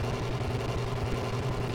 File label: Battery Indicator-Machine On Loop; Sx Purple Battery Indicator-Machine On Loop 02